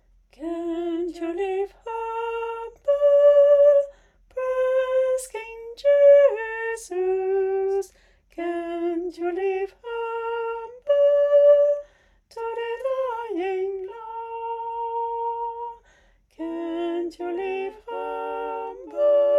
Sopranes

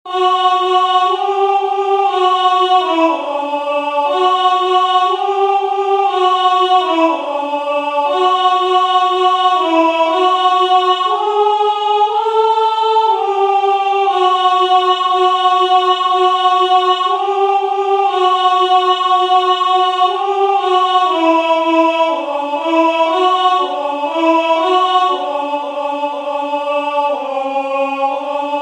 alto; We shall overcome

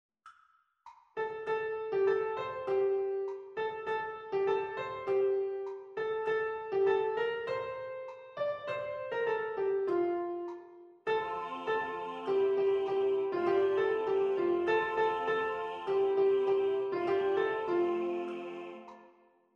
Soprano Piano; Cric crac

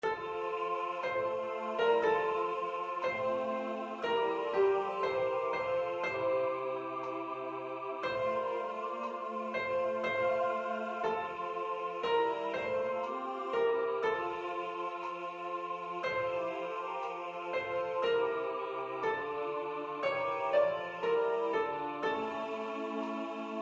Whole Tone Lower Tenor Piano

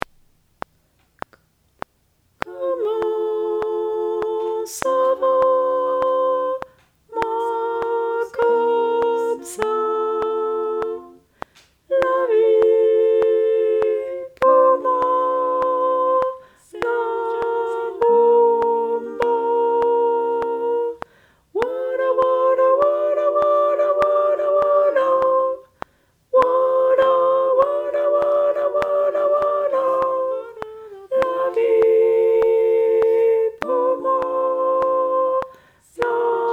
Tenor

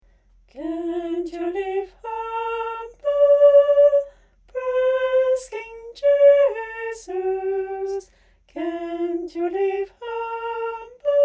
Tutti

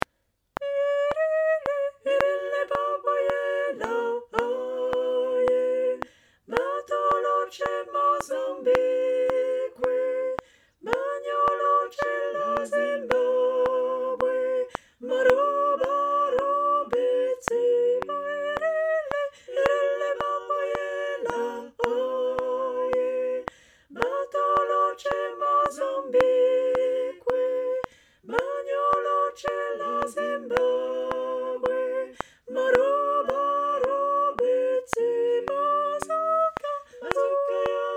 tenor

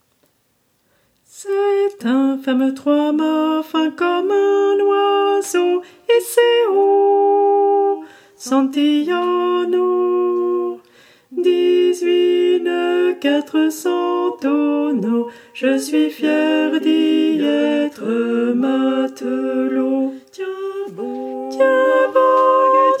santiano sopranes

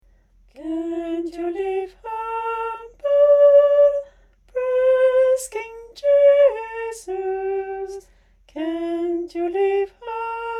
Alto

